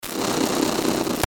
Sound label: Static Clip Glitch Chopper, Distortion Static Sweeper; Production Element Imaging Element Accent Transition; Blastwave